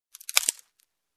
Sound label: Cracking Stick